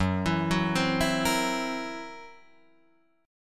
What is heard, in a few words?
Gb 7th Flat 5th Gb Guitar Drop D